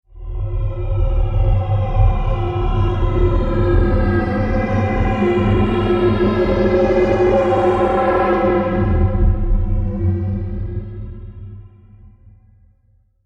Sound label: sound design texture dark scifi; Sound Design - Dark Science Fiction Style Texture; Strange Tones; McKinney Sound